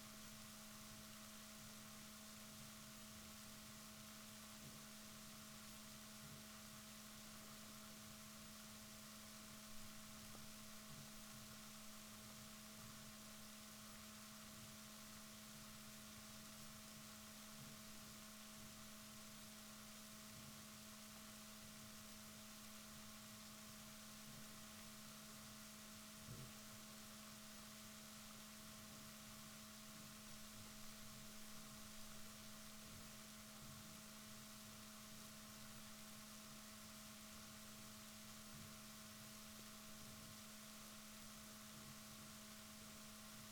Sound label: rorquals negatif, Balaenoptera Physalus Chile